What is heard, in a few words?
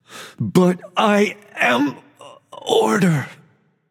death; smite; tsukuyomi